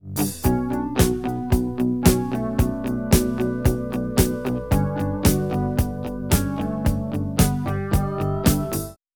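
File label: drums and trumpets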